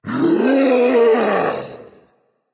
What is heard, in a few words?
battlecry berserker; cuchulainn